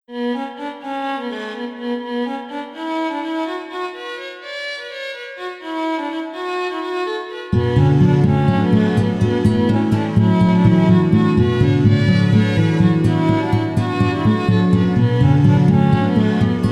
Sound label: lets dance with elves, 432 Hz